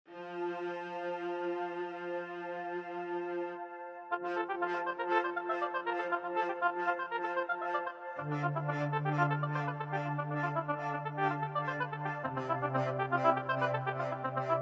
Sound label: French Horns